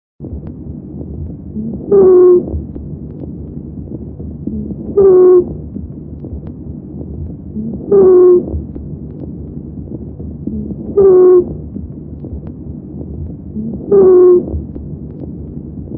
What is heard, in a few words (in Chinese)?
高调干啰音（哨笛音）; XinFeiTingZhen